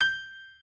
piano

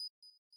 arp

